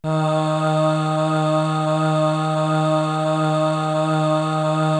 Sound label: cho d#2, Choir, KORG DSS-1